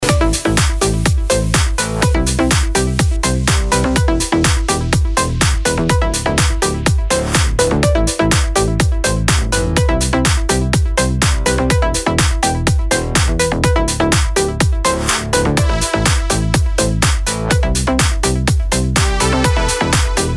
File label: Disco